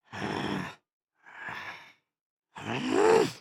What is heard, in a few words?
indignant, emotes